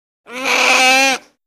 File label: Otter Sea Call Whine